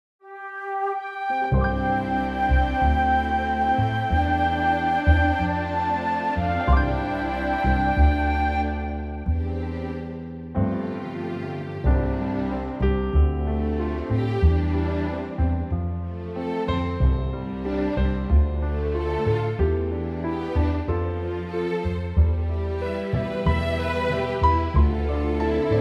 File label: orchestra